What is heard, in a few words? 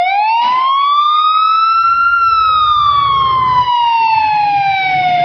siren